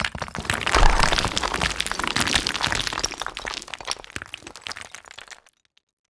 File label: stones medium fall